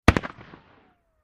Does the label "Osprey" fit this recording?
No